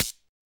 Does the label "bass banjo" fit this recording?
No